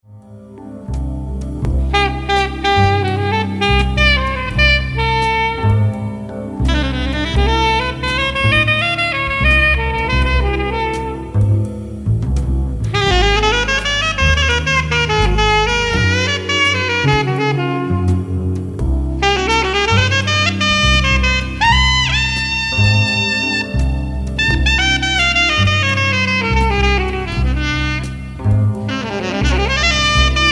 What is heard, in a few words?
Song Alto; Marmaduke SC